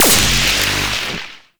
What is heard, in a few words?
Powerplant Tesla Coil Hit; Gameplay; Warframe; Corpus Railjack; Tether Power Plant; Ambience